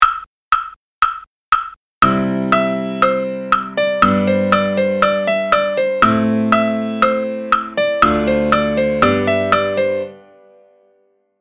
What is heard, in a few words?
chord